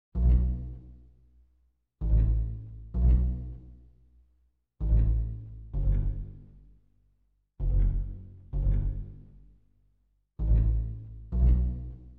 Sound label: Compass Pizz Strings